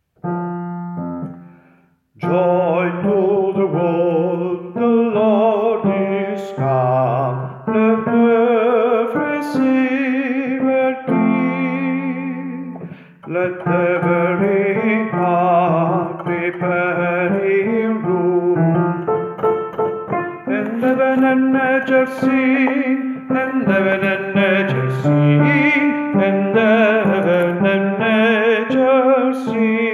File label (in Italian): baritoni; joy to the world